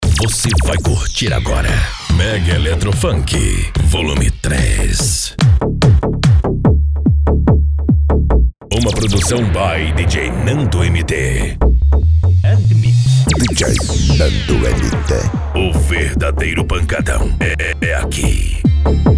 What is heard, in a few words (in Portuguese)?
mega electro funk